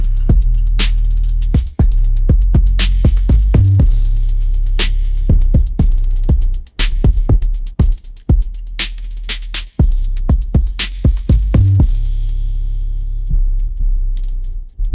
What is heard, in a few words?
upbeat pop melody